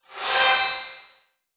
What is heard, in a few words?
perk heal; World of Tanks Blitz; GUI halloween 2018